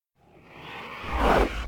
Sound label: shrimp Agr; customnpcs